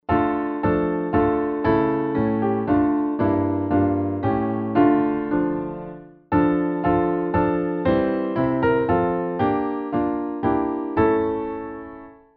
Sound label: alt tune; stewardship